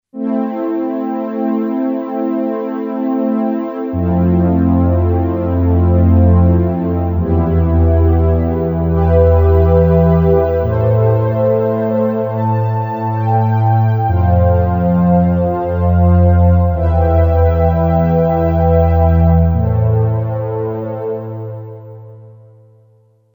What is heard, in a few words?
Area 51; Yamaha PSR-550; Voice